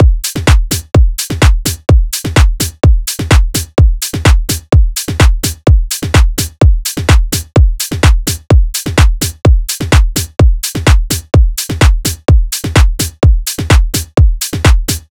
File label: Drum Loop - 127 BPM, House; All There